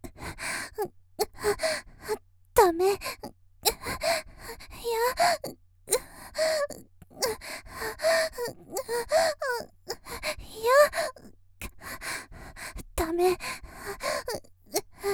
「喘ぎ」（だめ、やめて）と言いながら（嫌がり）喘ぎ; 綾波系; 少女

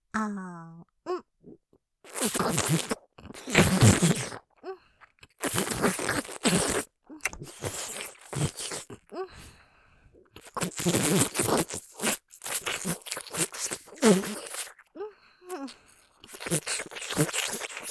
舐め音◆激しくじゅるるバキュームフェラ◆音のみ; ループで楽しむ！シェフの気まぐれ抜き抜きコース

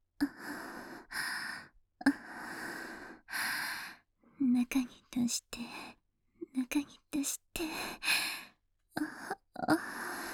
喘ぎ, ASMR

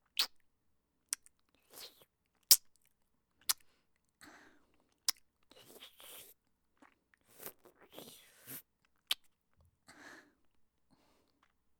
耳舐めオンリー 右, ASMR

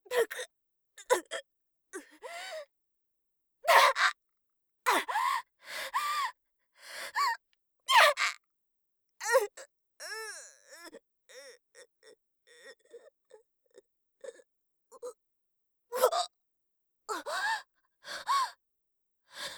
顔面を蹴り上げる; 効果音なし

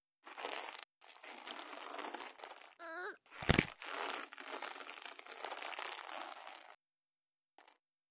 首折り

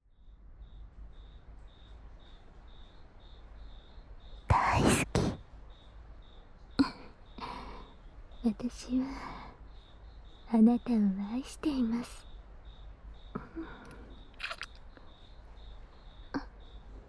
キスと耳舐め

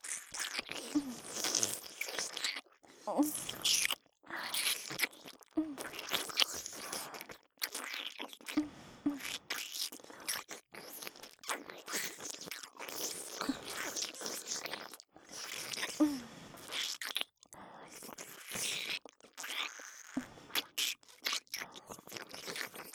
両耳舐め キス＋ジュルル; お姉さんの爆乳パイズリに溺れて抜け出せなくなるお話～おねショタから貢ぎマゾまで～; 妹すたー; オナサポ差分

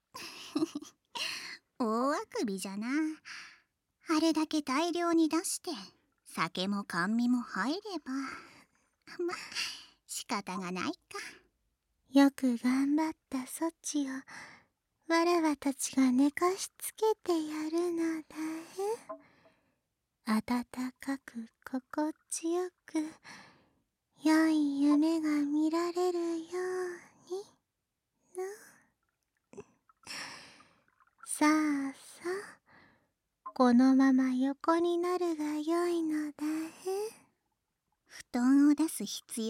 幻惑耳舐め入眠,ASMR; いたずら稲荷に囲われささやき弐-お狐ロリババア姉妹に熟成白濁酒を飲み尽くされる甘やか立体音響; 幻惑耳舐め入眠の儀

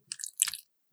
くちゅ音, ASMR

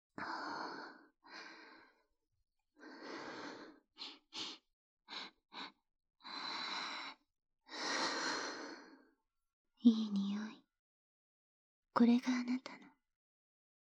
理性の限界フェラ, ASMR

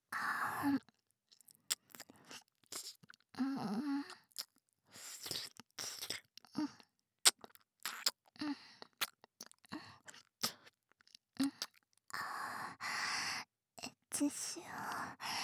エッチしよ 耳舐め, 左, バイノーラル; DL製作班; ハーレムオナサポ 4人のメイドがご主人様のオナニーをお手伝いするバイノーラル

